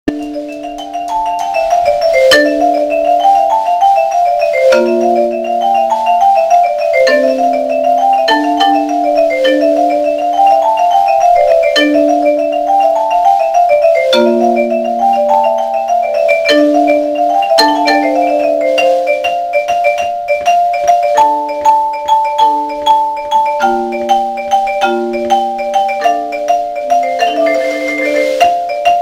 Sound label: Xylophone